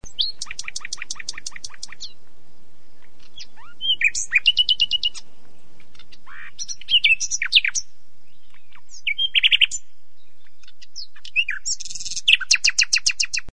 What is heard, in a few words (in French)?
rossignol